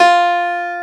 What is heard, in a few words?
STACK PIANO; AKAI S6000 CD-ROM - Volume 1